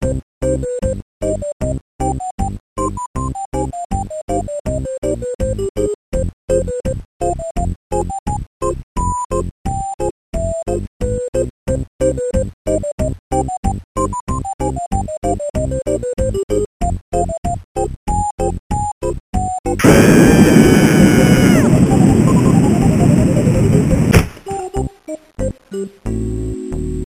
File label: bazooka